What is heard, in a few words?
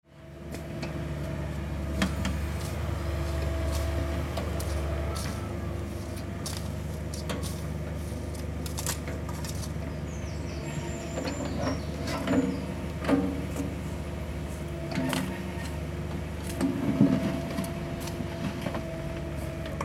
construction site atmo